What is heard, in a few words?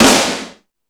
Metal Drums, E-MU Pro-Cussion; E-MU Pro-Cussion-1